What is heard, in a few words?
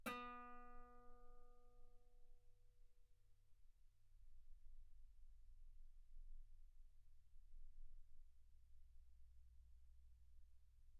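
Guitar ff B string B3; sul B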